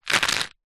medicine vitamin c tablets plastic jar movement shake; zapsplat